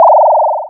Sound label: Cry